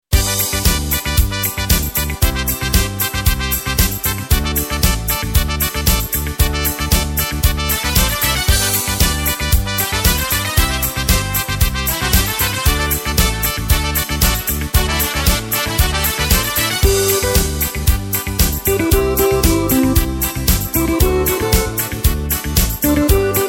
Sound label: Salsa